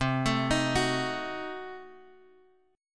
C Diminished 7th down Guitar DADGAD

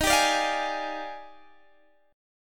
D sharp Major 11th, down, Guitar, Standard; strummed; JGuitar

